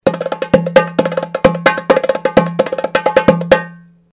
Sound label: Drum; ceramic drums